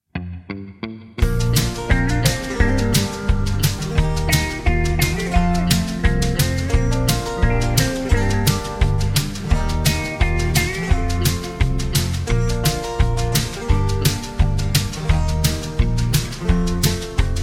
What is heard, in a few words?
(Bb To C) No Backing Vocals